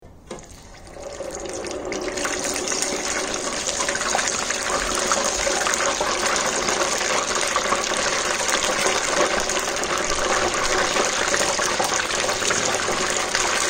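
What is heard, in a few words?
deep fryer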